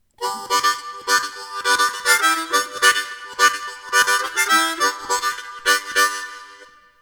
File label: ALL MINOR HARMONICA Chords richter; Seydel